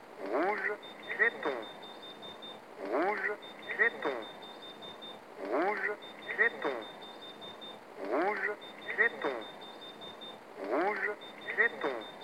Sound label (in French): feu tricolore